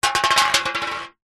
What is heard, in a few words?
food drink can soda empty knock over wood floor